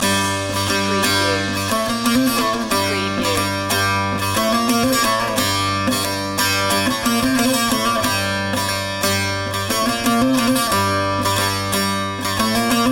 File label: eastern ceremony loop